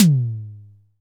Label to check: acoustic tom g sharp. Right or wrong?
Right